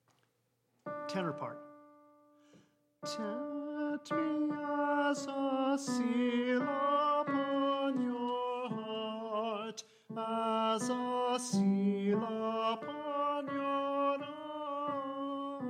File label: Tenor